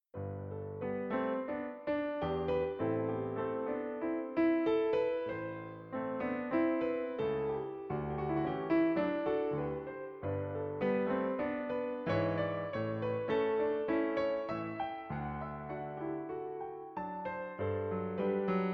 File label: Air in G Piano